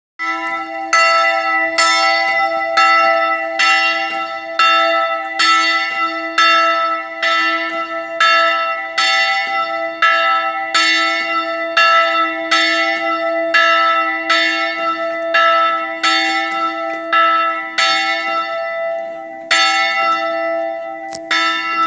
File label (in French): cloche; POUFFONDS